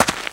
walk land, FootStep; WarRock

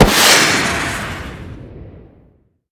Javelin Exp; Weapons; WarRock

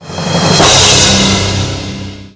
Round Win

